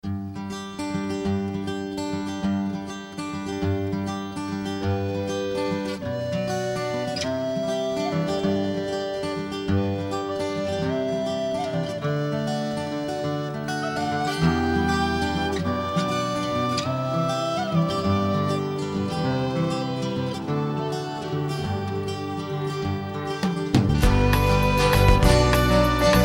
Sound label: Celtic Journey